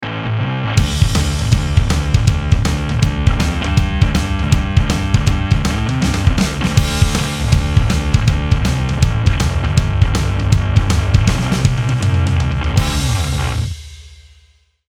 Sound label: classic, bass amp; bass amp designer; logic pro